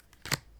card Place, Casino; Kenney's Sound Pack

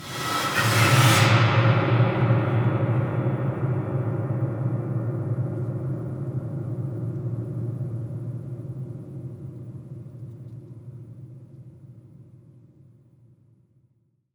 brush 1shots acoustic, The Keyless Piano Body; Detunized

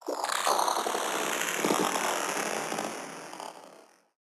Movement; 99Sounds; InterSpace

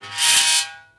yelling metal; Sound Design Tools; Raw Foley

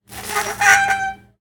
metal screech; Sound Design Tools; Raw Foley

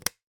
button on off; Switches; 3maze; Buttons and Switches

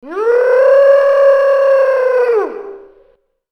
Aliens Monsters; FXHome

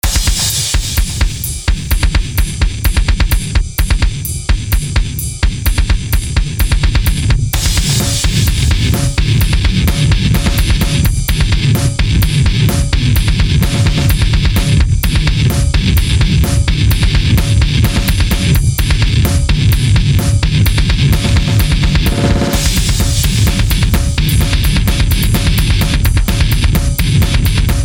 Dramatic Metal Entrance; OpenBundle; Background Music and Loops

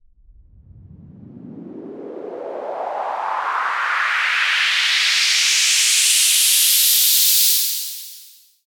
Short Sweep, White Noise

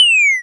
fall, Retro Sounds; Kenney's Sound Pack; Retro Sounds 2

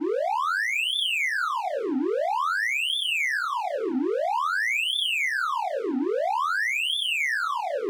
Data Streams Alert Loop; soniKSound

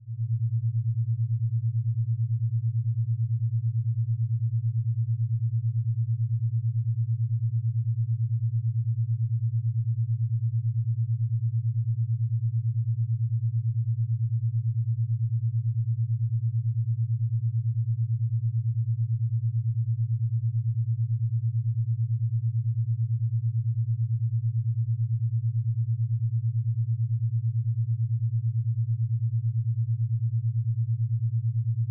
110Hz (9Hz Alpha Waves); Mind States

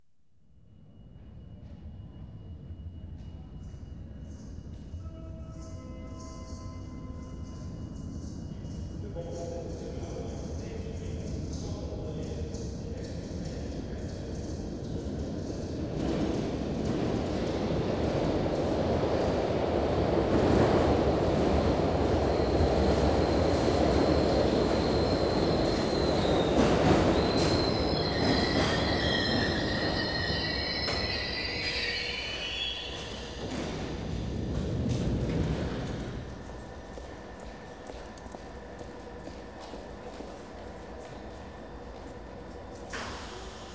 London Underground Trains; BBC Sound Effects Library

